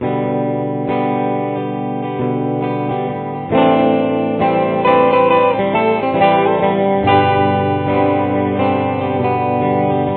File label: guitar